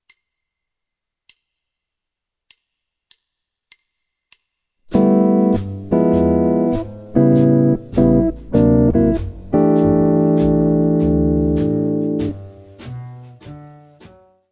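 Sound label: lick, guitar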